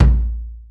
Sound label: drum bass